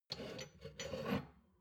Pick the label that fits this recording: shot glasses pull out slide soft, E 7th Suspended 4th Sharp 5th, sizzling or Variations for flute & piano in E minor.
shot glasses pull out slide soft